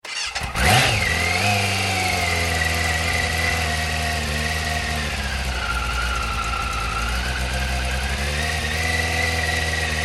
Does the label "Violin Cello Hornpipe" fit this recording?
No